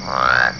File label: crawfish frog call; texas nature trackers; amphibian watch